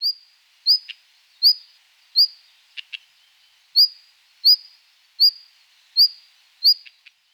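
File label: sinehvostka golos, red-flanked bluetail voice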